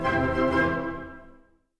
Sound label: Comical End 64bpm; Music Dialogue